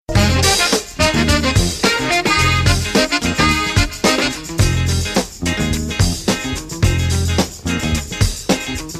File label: jazz tone